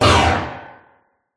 impact burst descend tonal into resonance metallic threatening design; lazer energy; skyclad sound